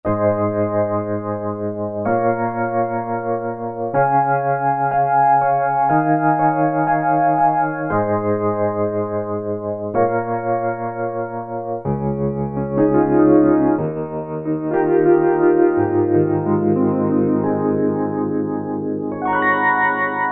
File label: Wurly Trem; Piano; Roland SR-JV80-03 Piano